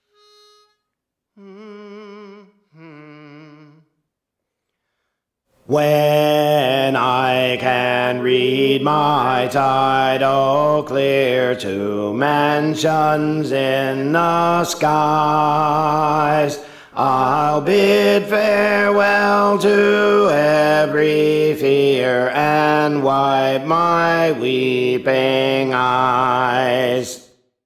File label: Alto; Praise For The Lord Training